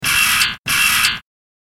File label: ringtone; farnsworth